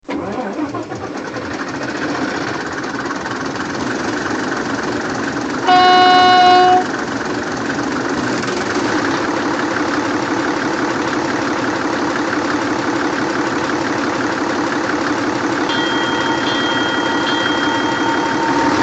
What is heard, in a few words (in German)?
Dieseltriebwagen; ESU; Loksound; VT 858 DRG